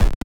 8-bit clean kick; cyber drums 2023; drum kit; nova sound

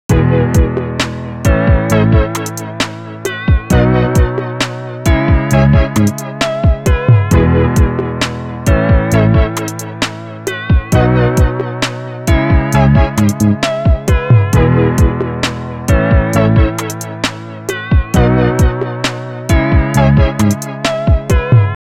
tape wow

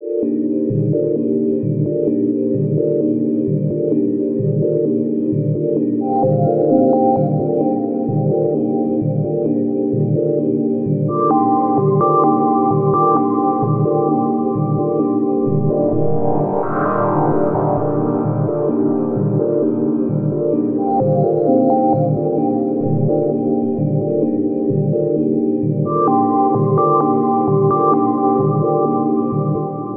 planet dub; porphyra hybrid by ocean swift synthesis

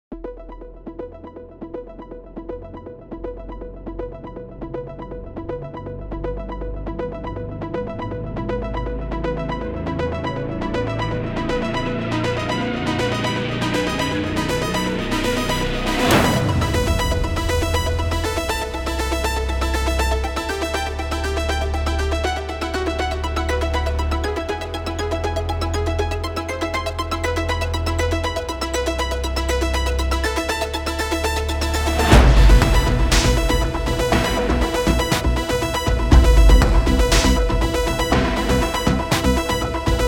cinematic cyberwave; Noizefield Instruments; starfish

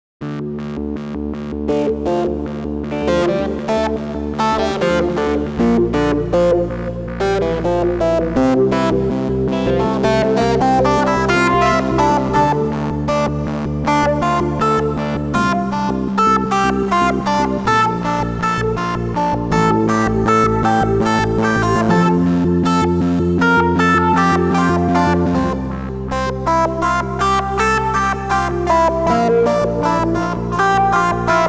square action seq; the digital life

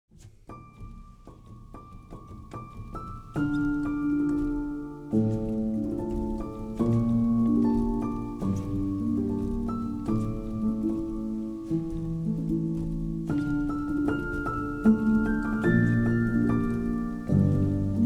inside the piano, felt piano; aeval felt piano; dark fairytale instruments

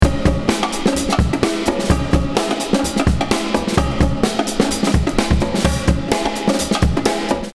example drums

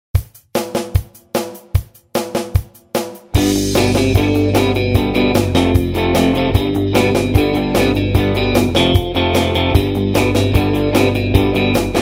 guitar island

